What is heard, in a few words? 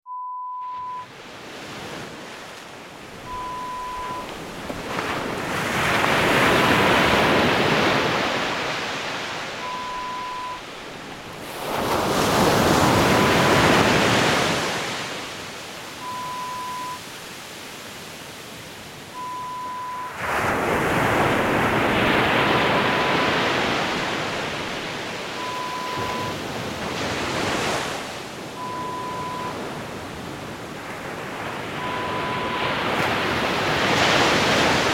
Relaxing waves